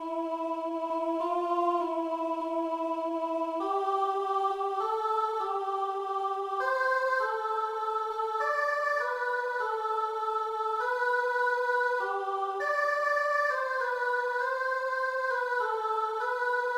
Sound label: Soprano; Come Sirrah